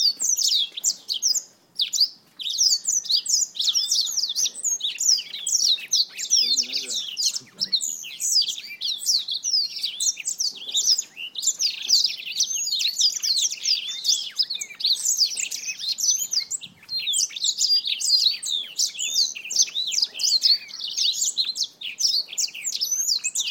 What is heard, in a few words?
Black Throat Finch